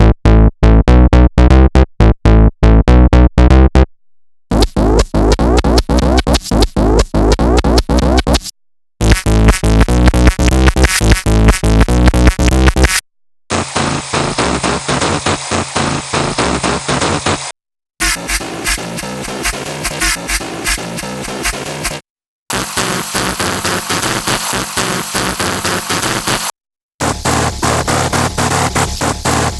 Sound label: Bassline